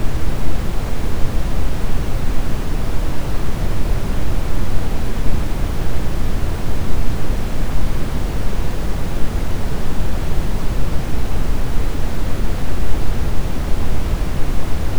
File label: Brown, Noise; Denoising